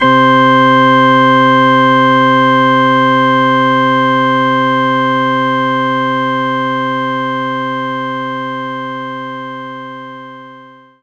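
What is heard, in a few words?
Church Organ C3; knights try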